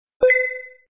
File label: cueball alert; billards